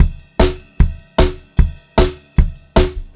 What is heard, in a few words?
152 SPEED RIDE, Pop-Rock Drumloops; USB Soundscan vol.37; AKAI; 90 s Sample CDs